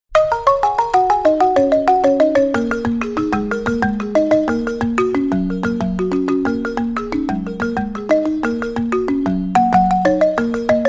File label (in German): natur balafon